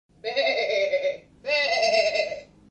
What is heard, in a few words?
goat